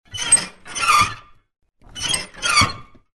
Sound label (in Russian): zvuki dverei